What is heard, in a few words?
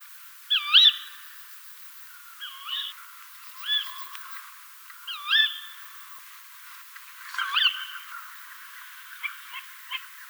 call, tawny owl, Strix aluco